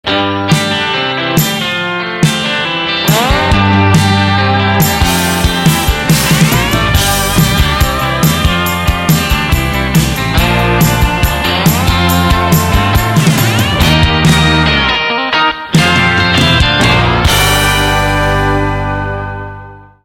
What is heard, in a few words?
Kick It